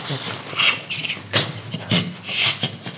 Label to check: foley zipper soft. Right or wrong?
Wrong